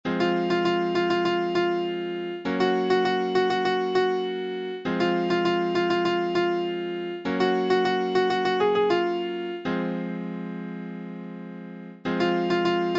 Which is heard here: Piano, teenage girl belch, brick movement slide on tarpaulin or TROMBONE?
Piano